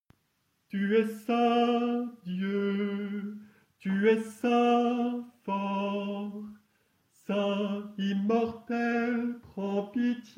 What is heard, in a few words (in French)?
Tu es Saint Dieu